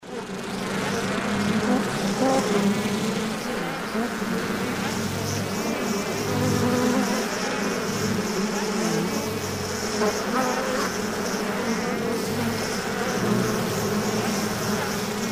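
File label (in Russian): zvuk jujaniya pchel v ulee, sound of bees buzzing in a hive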